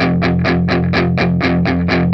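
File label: 125, Vintage Blues Guitar rhythm; USB Soundscan vol.22; AKAI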